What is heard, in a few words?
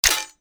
attack pre launch